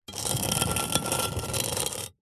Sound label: foley stone or flat rock single knife blade scrape scratch hard